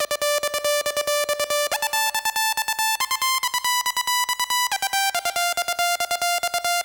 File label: Tranc, Techno Melody; TechnoMusicMaker